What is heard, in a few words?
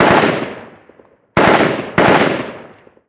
gun ak47 med; amb elements; modern warfare 2